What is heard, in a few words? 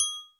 TRI.H, PERCUSSION